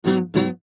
Cartoon Acoustic Guitar Strum Twice Musical Instrument